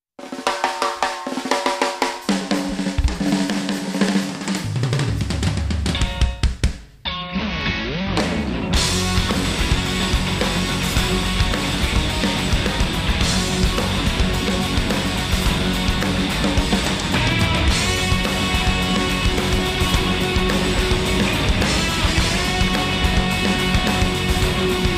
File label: Rock Track